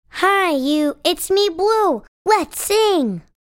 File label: Station Id; Nick Jr Radio